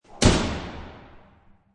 Door Slam Big Reverb, Door slam shut in underground garage, heavy reverb; Lynne Music